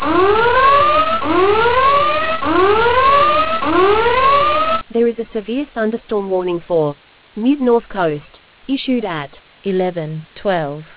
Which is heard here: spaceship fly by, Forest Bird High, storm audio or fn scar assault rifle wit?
storm audio